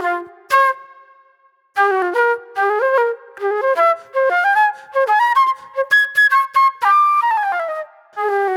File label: Raw Funk Flute; Sonic Foundry (Sony Creative Software); Manhattan Flutes